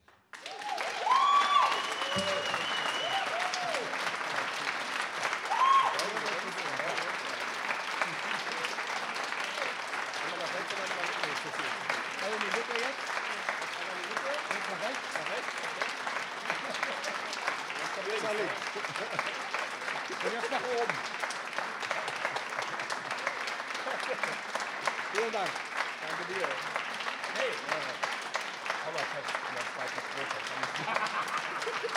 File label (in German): Applaus Ende